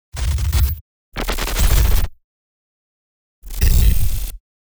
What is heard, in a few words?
Glitch Layered; GlitchBlog